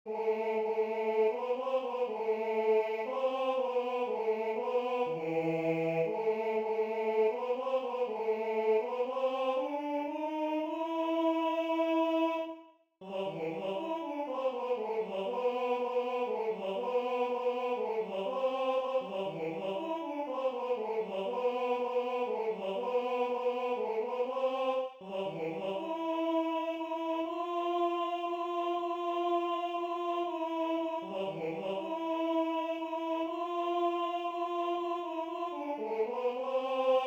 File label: Tenor I; Varda che passa Bella Bimba